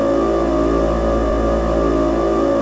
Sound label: game engine; GLtron